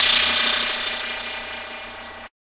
Sci-Fi, Classic SFX, cartoon

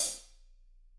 HH, Drums; Bob Clearmountain Drums I

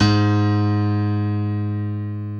PNO G#1, DANCE PNO

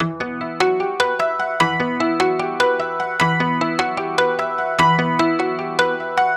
Arp, Keyboards

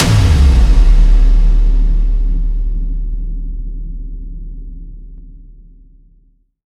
Sub Kicks, Bassdrums

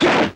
SWISH SEQ, swishes; Total Control - Action Cutz

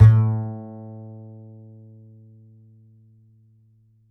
D, Upright Acoustic Bass; Larry Seyer; SWITCHABLE POSITIONS

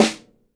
yamaha snare

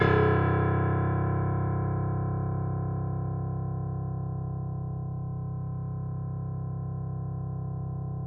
piano; East West; The Ultimate Piano Collection

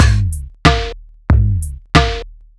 Heavy Industry, Drums Beats

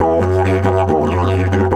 F 140, DIDGE RIFFS

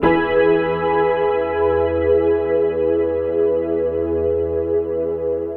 Big E Chord, Drum & Bass

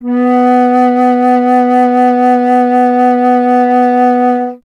Alto Flute, Solo Instruments

